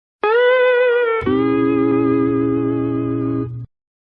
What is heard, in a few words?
MUSIC STEEL STING; SPONGEBOB